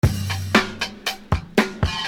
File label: Beat, Hip-Hop Street Beats